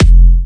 Jump Kicks, Bassdrums

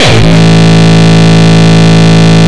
Longkick, kicks

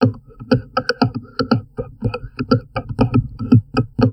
TALK, Drum Loops Crazy Processed

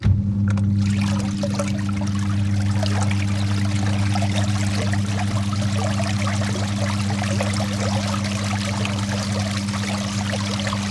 LAUNDRY 1; AKAI S-Series CD-ROM Sound Library VOL-6

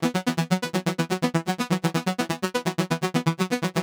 125 Blipp E, Keys, Nu Jazz House; PowerFX - Nu Jazz House

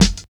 SNARE 2; East West - Phat & Phunky CD2; 90's Sample CDs

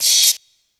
Open Hihat; Chillin Out; Sonic Foundry (Sony Creative Software); Stylus Pressure Urban Grooves on Digital Wax

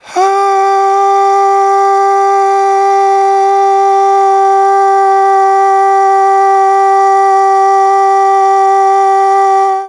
AHHH, Vocal; Polyend

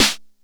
Bass Snare, Jomox Airbase99, Drum Machines

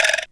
FROGGY; SFX; Back In Time Records - Big Bang

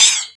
RSS, 160 BPM

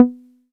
SYN 303, Roland TB-303

